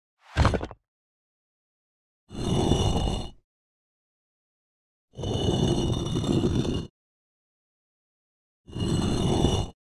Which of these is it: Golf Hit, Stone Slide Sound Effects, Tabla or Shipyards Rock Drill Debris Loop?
Stone Slide Sound Effects